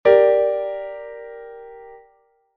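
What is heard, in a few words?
G minor chord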